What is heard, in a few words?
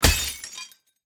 Enemy Bottle Throw Impact